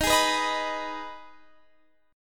Eb Suspended 4th Sharp 5th, Guitar Standard; Ebsus4#5 strummed; JGuitar

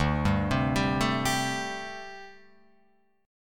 D 7th Suspended 4th, down, Guitar, Open F; arpeggiated; JGuitar

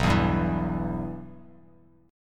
B Suspended 4th Sharp 5th B down, Bass Standard; Bsus4#5 strummed; JGuitar

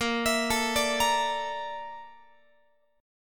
A sharp Major Sharp 11th, Guitar; arpeggiated; chordshape

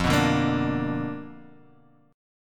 B Minor Major 7th Flat 5th, chord

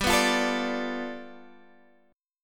G 9th

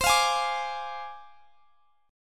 D Minor 7th Flat 5th C, guitar chord

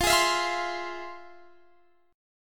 F Minor Major 7th, Guitar Standard, chord; strummed; capo 9

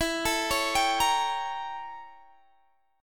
A 7th Flat 9th E

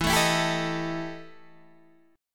E Minor Major 7th Double Flat 5th, Guitar, DADGAD; chord shape

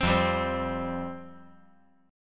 F Suspended 2nd, down

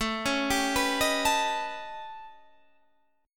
A 7th Sharp 11th; A7#11; arpeggiated; JGuitar

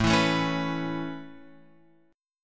A Major Add 9th A Guitar Open F, chord; Aadd9 strummed; JGuitar

